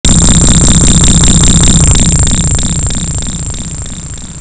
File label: robot; svencoop; breakfree3